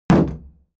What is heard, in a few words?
shield sheet impact dent